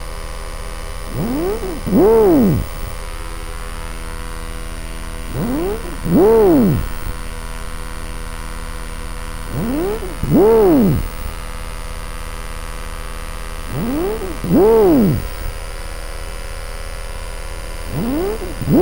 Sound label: Ford Focus In Motion Windscreen Wipers, Electromagnetic Fields; ktwAudio; Unheard Sounds